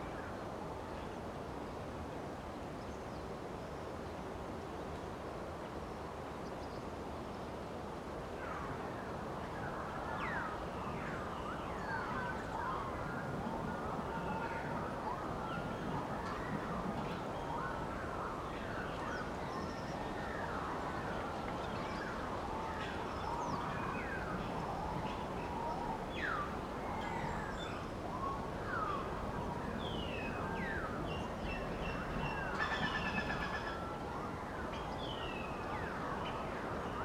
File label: General Ambience